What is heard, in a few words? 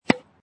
Tennis Hit Ball with Racket Close; Tennis Sound Effects; Tennis (Sound Effects:Sports)